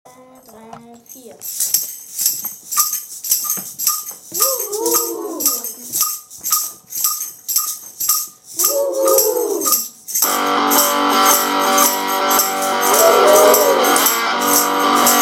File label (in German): song